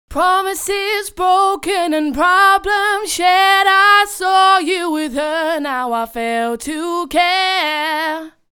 120 Fm

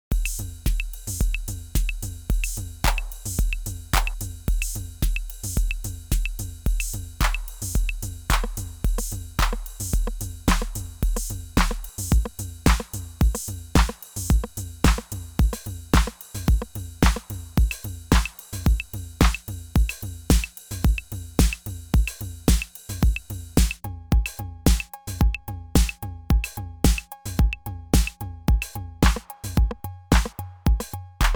real time instrument swaps

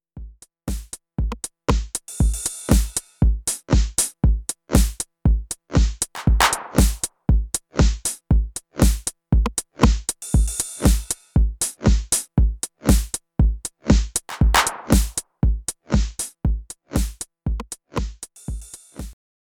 snare delay

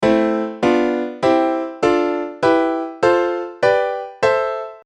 A minor Chords

